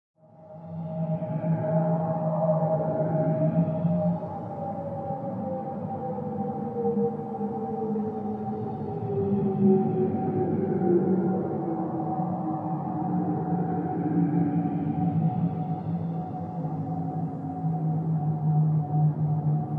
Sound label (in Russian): atmosfera prizrakov